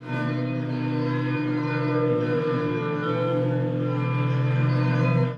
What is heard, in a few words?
gtr orignal